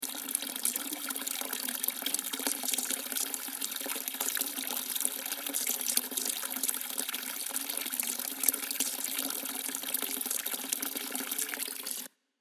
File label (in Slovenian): voda tece